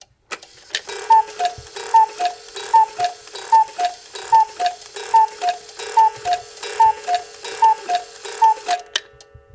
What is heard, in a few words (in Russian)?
zvuk kukushki v chasah s kukushkoj